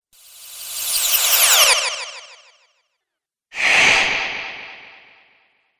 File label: Magic Spells; Magic Sound Effects